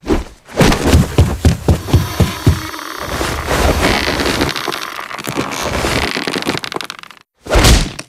attack; tobias

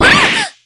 shock; yukari

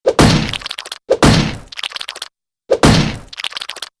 eel chop

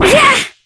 damage; neopolitan

